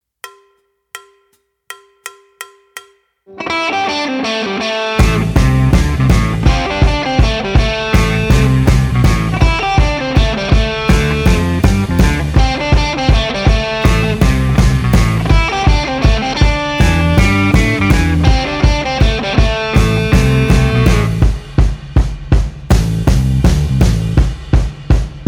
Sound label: Boom Boom Duo, E-Gitarre; Schule fuer E-Gitarre